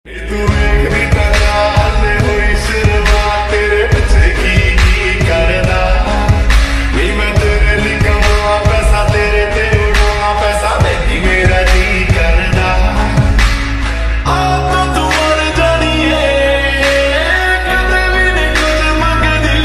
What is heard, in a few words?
Love Feeling Music Ringtone